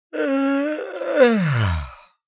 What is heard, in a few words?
Adult tired man single yawn